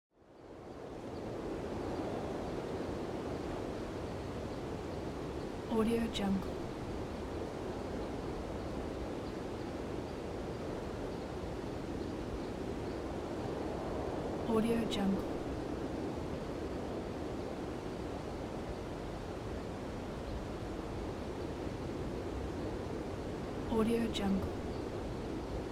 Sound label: light wind